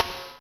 S Gate, Electric Snare, Yamaha DTXpress

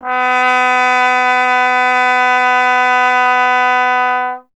Trombone B; Miroslav Vitous - Solo Instruments VOL-1

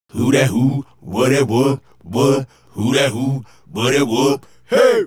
Male Group, Hip-Hop and RnB Vocals

